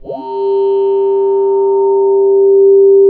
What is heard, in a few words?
SYN, Drum & Bass, 160 BPM; Ueberschall; Drum & Bass Flash Trackz